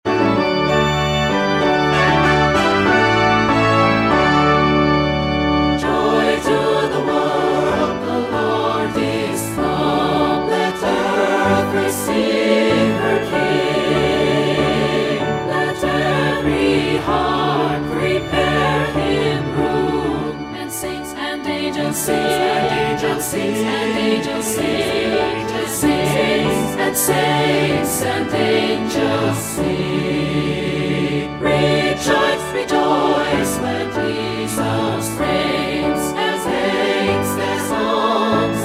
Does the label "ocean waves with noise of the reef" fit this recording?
No